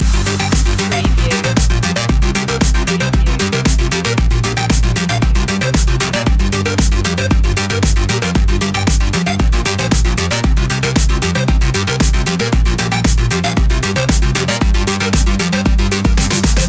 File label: electro circuit loop